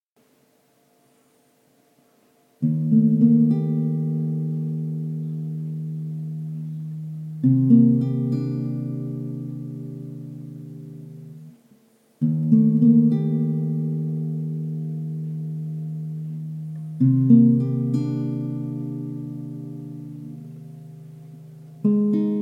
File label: D 100 Acc GTR chill groove